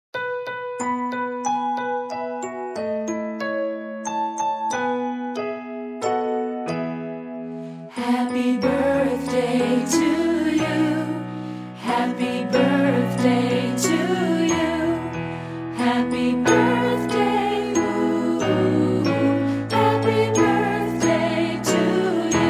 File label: BIRTHDAY SONGS Happy birthday to you